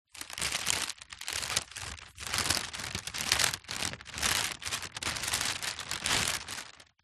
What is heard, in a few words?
plastic bag carrier thick empty gentle handling movement; foley